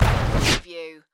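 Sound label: Production Element Hit Whoosh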